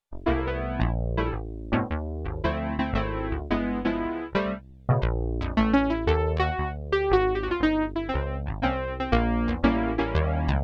BASS & PIANO, SIEL DK 80; Factory

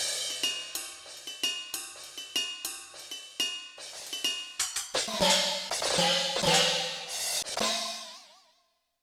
Multi Kit, Drum Kit, Yamaha TX16W

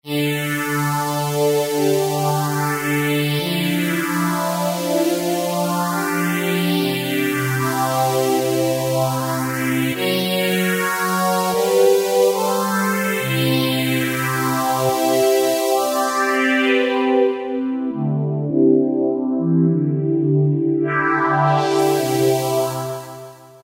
Stream Pad, Korg microKORG; Factory Voices

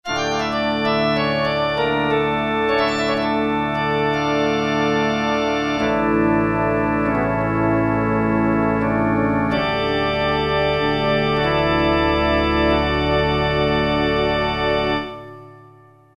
Pipe Org 1, Roland MT-32

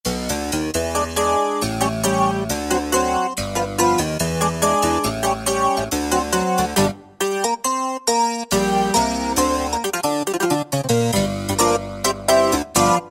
Roxichord

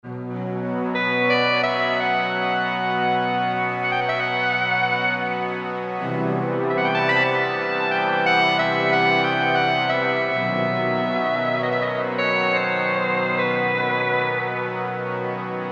Brass; Orca + Sop

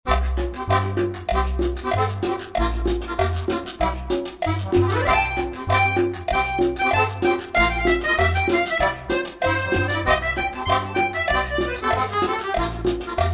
96, Latin; World; CmbJamin; Roland SR-JV80-18 World Collection Latin

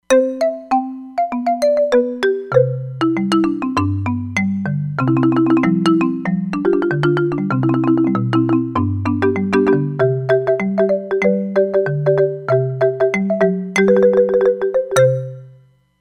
Marimba, Roland Fantom XR

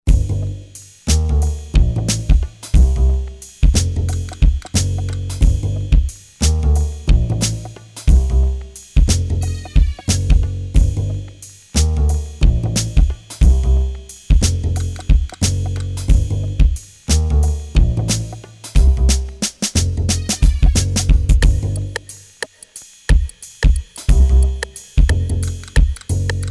LOUNGE, AKAI XR20 Factory patterns

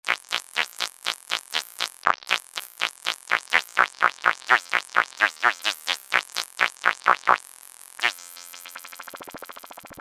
Putney Ramper, Roland JD-990; Vintage Board

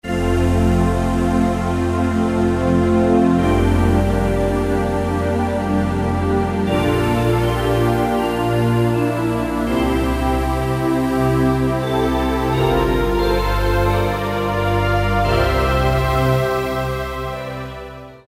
Silk Road, Korg N364; Factory Combi demos

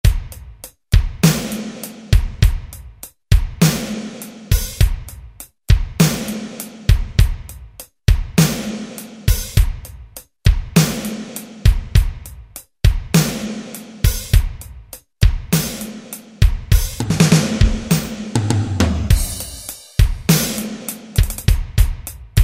Ballad, Alesis SR-16; Preset Patterns

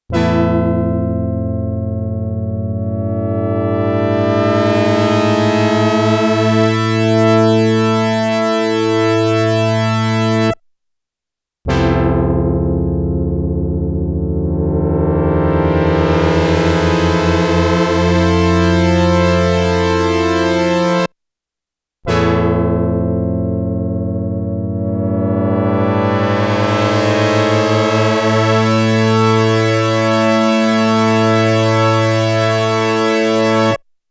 GLIDE BRASS I, Korg DW-8000; Factory Patches

